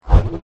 whoosh x3 short fat quick resonant ascend stepped left right with ascend; tonal popup; Skyclad Sound